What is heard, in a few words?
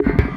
RISK FX; USB Soundscan vol.24; AKAI; Industrial Loops